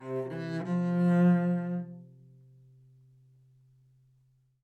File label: live string phrases, string phrase Cmaj 100bpm